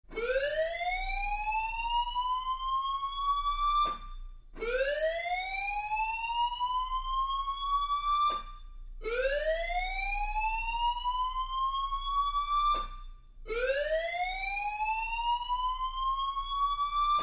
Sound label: Alarm Fire Building